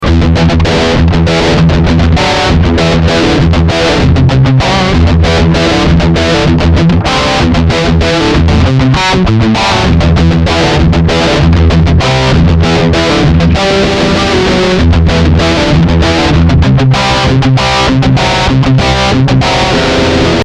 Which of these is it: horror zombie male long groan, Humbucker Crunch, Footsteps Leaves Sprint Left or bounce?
Humbucker Crunch